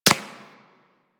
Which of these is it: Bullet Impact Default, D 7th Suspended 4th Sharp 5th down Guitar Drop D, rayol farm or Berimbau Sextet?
Bullet Impact Default